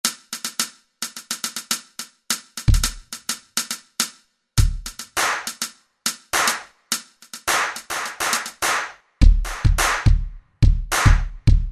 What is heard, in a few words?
Kit, DRUMS, Kurzweil K2000; Kurzweil K2000 Contemporary ROM; Contemporary ROM Programs and Demos diskette